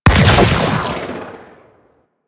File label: Opticor Shot; Warframe; Venus; Venus Sniper Spaceman Agent